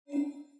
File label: eye mouseover; mihoyo hk4e concept animation